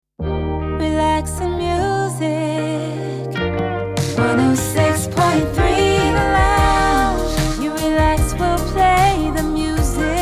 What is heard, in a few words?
Jingle Slogan; 106.3 The Lounge